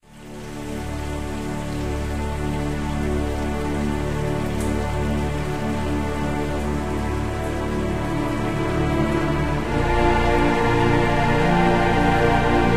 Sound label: Forest Night; Phase Shift